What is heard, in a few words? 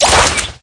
slide; Black garlic cookie; Cookie Run